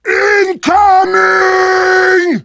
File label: incoming